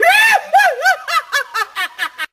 laugh

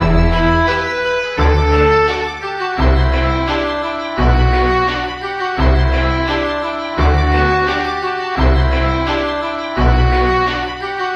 keys

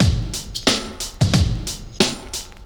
beam within, Drum loops Boombap

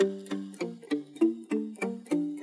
guitar

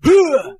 "huh", voice

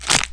bone break

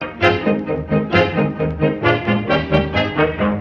horns, Phonky loops

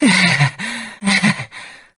breath female; ooh ahh

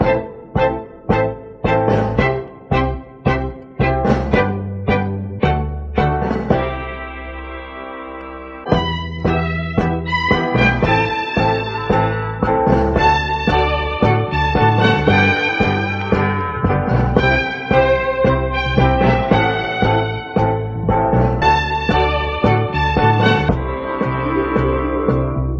strings, phonky loops

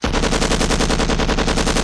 machine gun; Crash Bang Boom

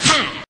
"ha" cartoon; ooh ahh

